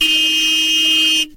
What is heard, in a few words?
car horn